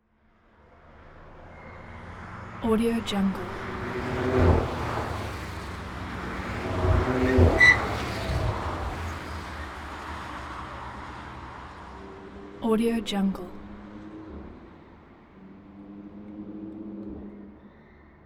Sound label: passby sportscar multiple